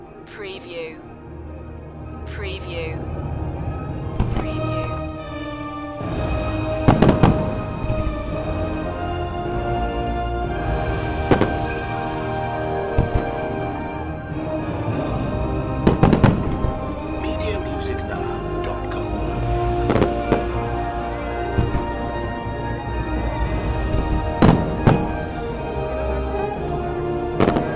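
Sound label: Fireworks Orchestra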